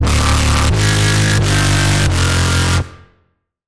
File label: Bass, Sylenth1; Sonic Shock; Routed Sound; Sylenth1 Expansion; Chaos Bringer Vol.1